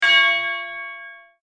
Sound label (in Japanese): 不合格